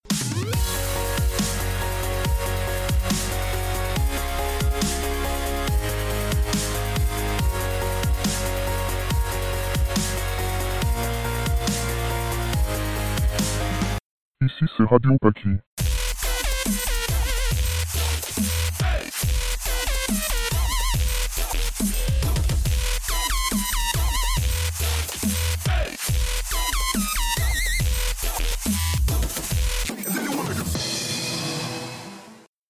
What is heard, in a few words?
Jingle